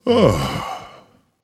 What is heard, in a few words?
sigh; smite; khepri